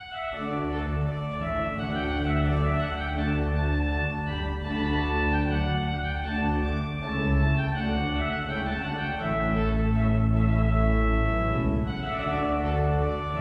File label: fanfare en rondeau; mouret